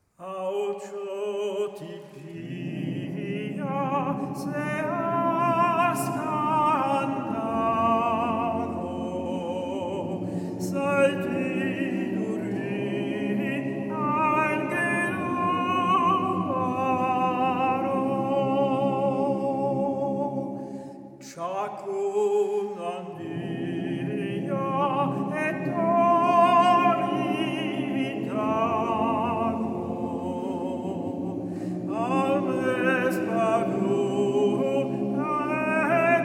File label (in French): berceuse basque